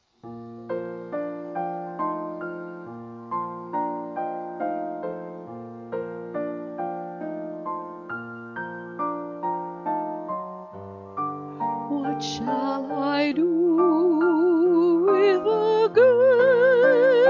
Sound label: Bubbles